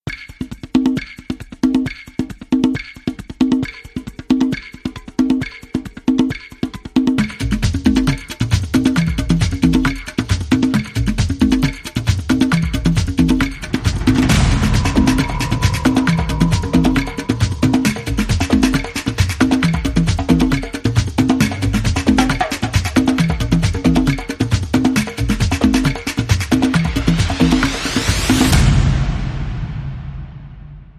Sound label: Small Drums